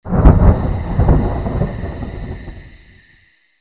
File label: Thunder